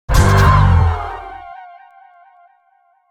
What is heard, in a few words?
Diva Sting